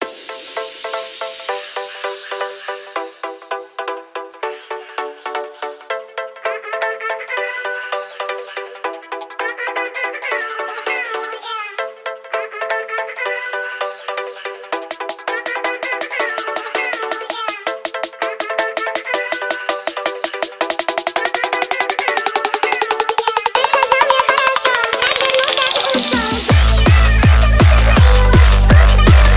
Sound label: hardstyle nightcore